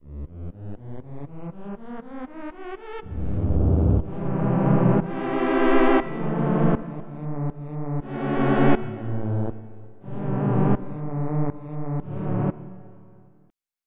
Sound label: MoveDuty; FF7